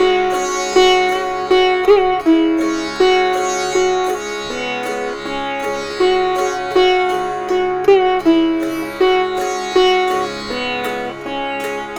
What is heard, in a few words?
Sitar, East Indian; Sonic Foundry (Sony Creative Software); Continental Drift Aftershocks